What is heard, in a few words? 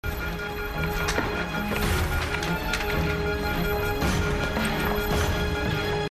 alarm